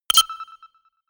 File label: notification pager